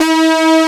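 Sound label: Synth Brass G2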